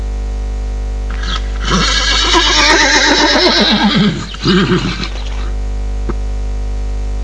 horse; Macworld (1998-05); ANIMAC Demo

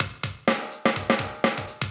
PERCLOOP HOUSE 125; eJay

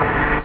techno

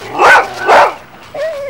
BARK

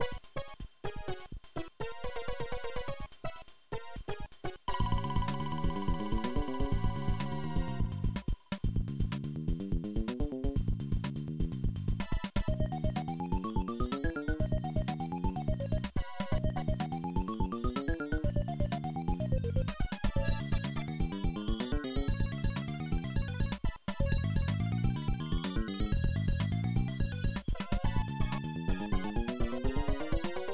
COOKED

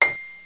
piano c7; Hexen

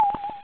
Radar ping; Mech Zone

